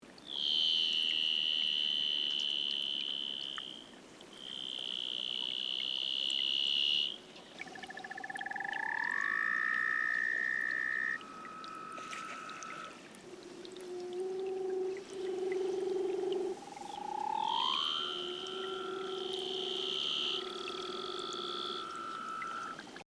Leopard Seal; pinnipeds

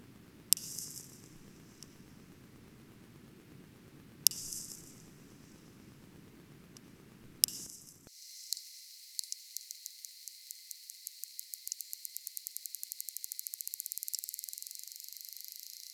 cuviers beaked whales

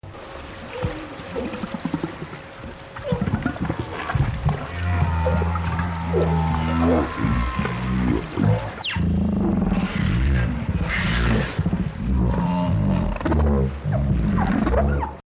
humpback social sounds, baleen whales

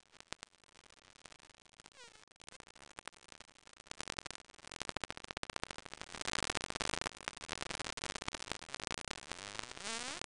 vaquita, vaquita porpoise; porpoises

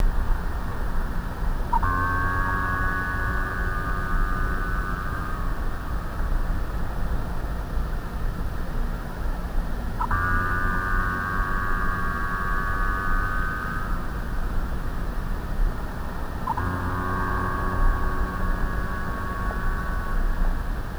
minke, minke whale; baleen whales

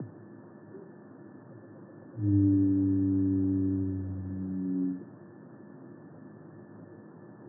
blue whale, baleen whales

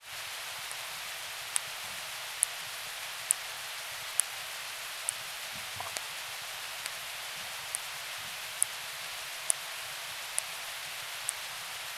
pygmy sperm, pygmy sperm whale; sperm whales

